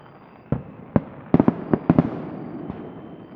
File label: firework